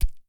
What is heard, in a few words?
flesh impact